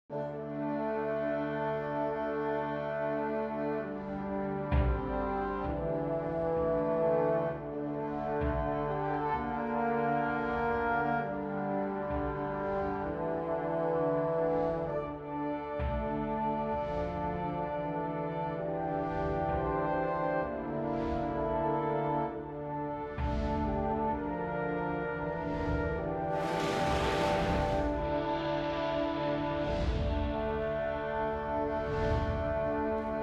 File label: dragon background music